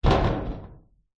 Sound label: impacts metal panel large hit loose rattle; zapsplat